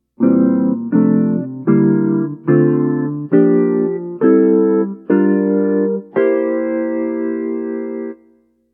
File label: Guitar Mixo